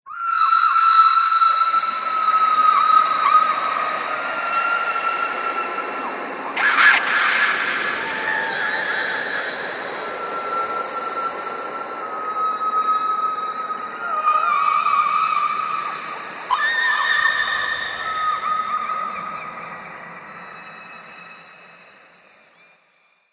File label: Screams Horror Atmosphere, scary soundscape